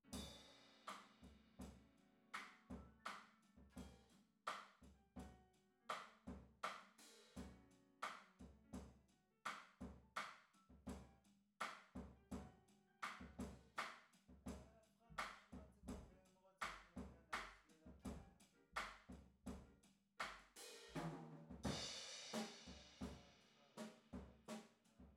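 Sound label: guitar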